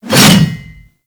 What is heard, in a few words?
Axe Swing; Warframe